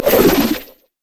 bola strike; LoL; hunter rengar

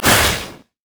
teleport; LoL; diana

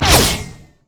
q attack; LoL; jinx base

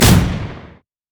teleport; LoL; diana

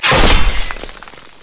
yasuo base basic attack; LoL

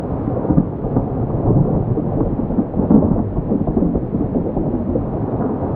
center relic buff loop; odin

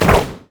q spell; LoL; ziggs poolparty

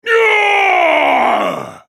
ultimate effort; Garen; Character Voices - English

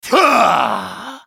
spellcast effort; BlindMonk

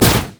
teleport; Diana

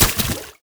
minefield lan; LoL; ziggs poolparty

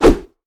basic attack knee; rengar

